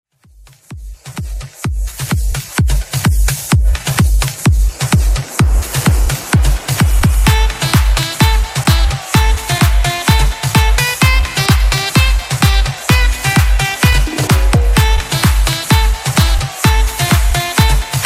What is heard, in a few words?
Latino Redrum 128 Bpm; Reton